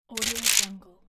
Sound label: tearing a sheet of calendar